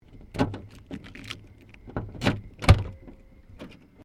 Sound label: CAR SUBARU LIBERTY EXTERIOR KEYS BOOT OPEN; Family members Subaru; Vehicles